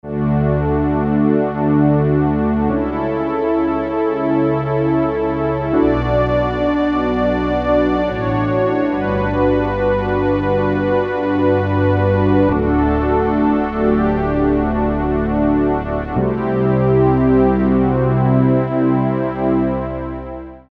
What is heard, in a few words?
Strings, DWGS synthesizer presets; Korg DSS-1; Korg DSS-1 Sound Library